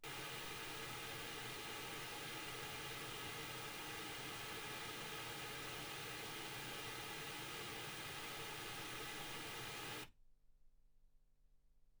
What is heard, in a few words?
pink noise 48kHz